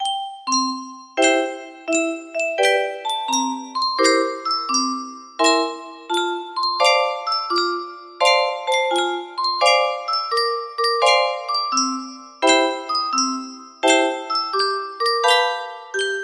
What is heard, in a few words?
F scale 30 note